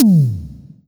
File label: Roland SC-88 Synth Drum C3